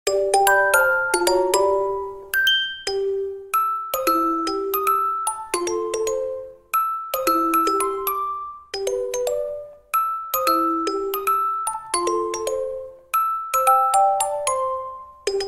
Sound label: Ground Night theme; SMM2; SMB3